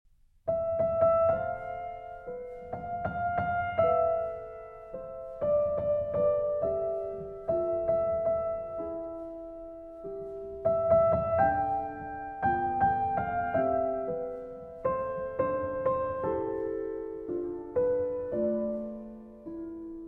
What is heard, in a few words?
Icelandic Folk Song Grand Piano